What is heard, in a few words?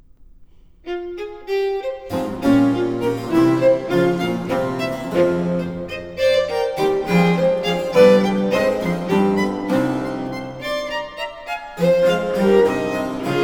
Violin Sonata in G Minor, II. Vivace; Corelli; Violin Sonatas Op. 5; La gioia; Lina Tur Bonet; Musica Alchemica